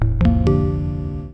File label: SYNTH Crystal